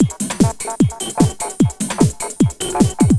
LOOP, Eurotech; Sounds Good

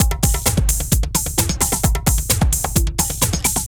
Drum Loops

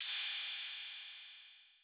808, CYMBAL; CYMBAL P2

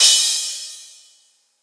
Crash, Drums Cymbal; House; Crashes; Best Service; House Definition VOL-1; Cymbal Stuff

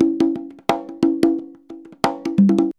CONGA 089, Percussion Loops; USB Soundscan vol.56 - Modern Percussion Loops; AKAI

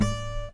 ACSSFTFNGR, Guitar; 90 s Sample CDs; Quantum Leap - Guitar & Bass

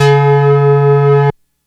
Analog Low C Pattern, Synths; Sonic Foundry (Sony Creative Software); Electronic Point Blank

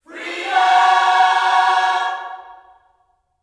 FREEDOM, Vocal Planet Gospel

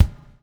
BUBL; Spectrasonics - Backbeat

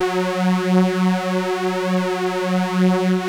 PULZE FLANG, LEADS; Masterbits; Vintage Voltage

